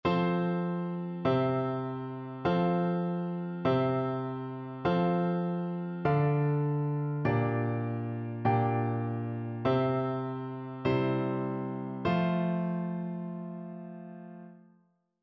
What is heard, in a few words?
harmonic error detection; ear training